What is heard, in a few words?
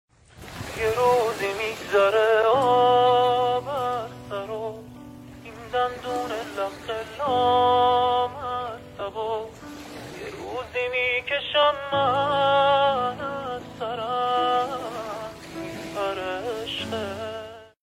Guitar; با گیتار